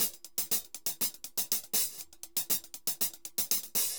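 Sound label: HH L, salsa drums and percussion; Best Service ProSamples vol.14; AKAI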